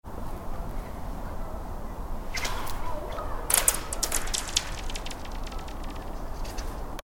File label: slipping ice sound